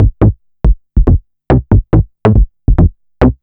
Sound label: bassline, dance; Basses